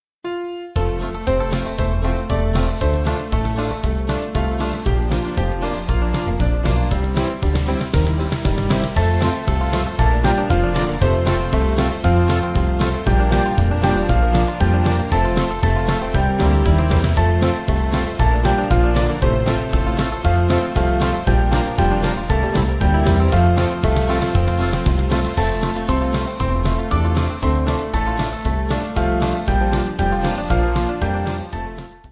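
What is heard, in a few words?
Band, Music Backing Files for Churches; Go Tell It On the Mountain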